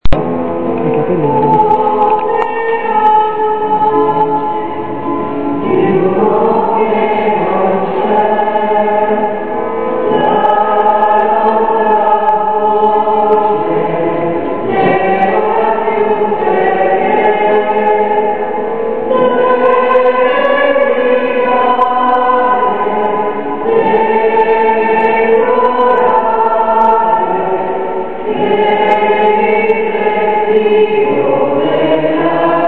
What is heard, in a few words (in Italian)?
povera voce coro; coro montesilvano